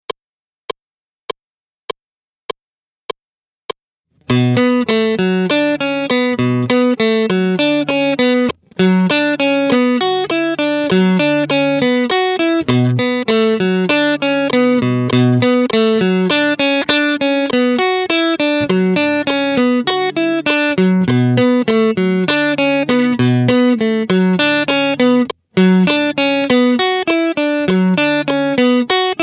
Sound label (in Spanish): solo 100 bpm, guitar